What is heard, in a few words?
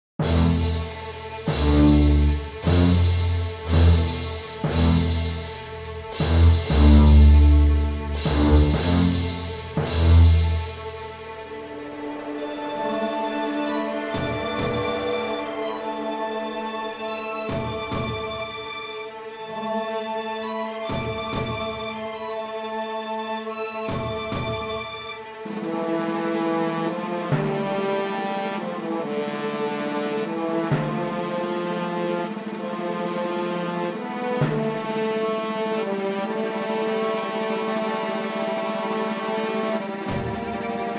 battle rocky cliffs